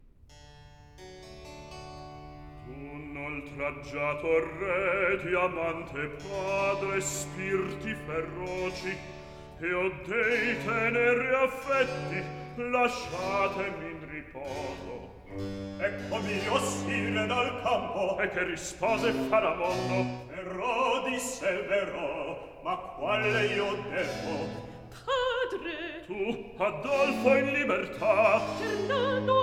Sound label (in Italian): Recitativo; D'un oltraggiato; Handel Masterworks; Klassika; Act III